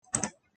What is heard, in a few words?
logitech computer mouse click